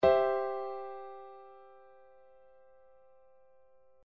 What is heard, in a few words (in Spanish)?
C 2da inversión